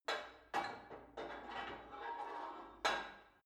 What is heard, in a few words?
put weight onto barbell unsteady